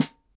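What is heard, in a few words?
snare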